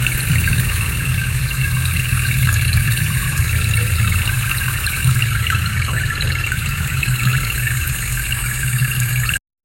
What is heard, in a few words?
guzheng